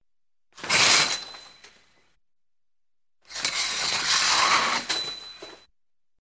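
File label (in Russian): звуки душевой шторки задергивание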